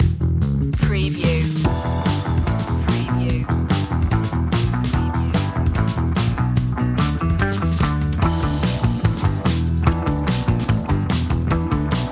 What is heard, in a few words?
cool attitude upbeat